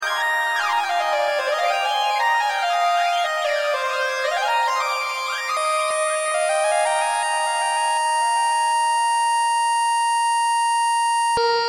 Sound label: Random Riff